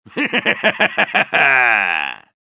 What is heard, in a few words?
Soldier laugh evil; Злой смех